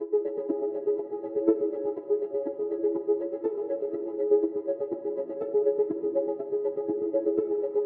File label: 122 BPM A maj F min Tonic C; Zebra; mmb studio soundtrack essentials